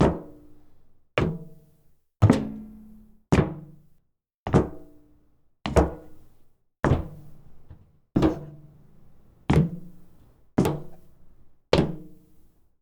Walking On Metal

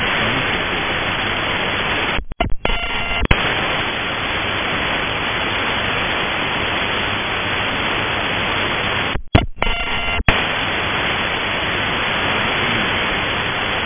AM Beepy

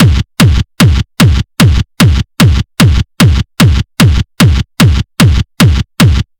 Hardtechno Schranz Kick Loop 150 Bpm

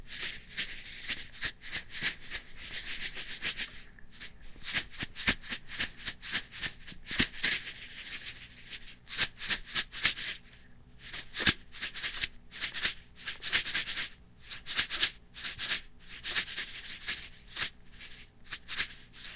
Salt Shake

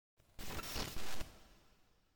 Short Electricity

